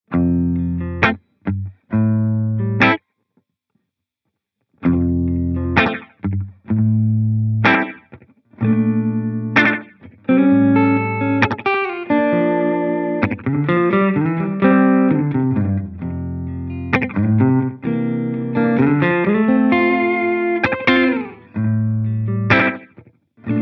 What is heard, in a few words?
Digital Short Stuff